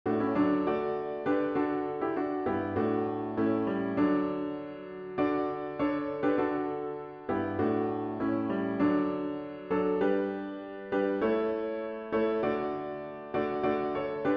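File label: Hymn piano with Intro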